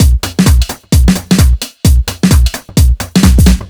130 Riddim Clash Full, Drum Loops 130bpm; Zero-G; Soundclash Nu Dance Electro; Acidized Wavs

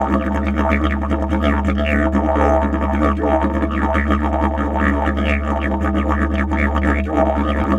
D BURBLE, Didgeridoo; Big Fish Audio - Didgeridoo and Other Primitive Instruments; BURBLDRONE

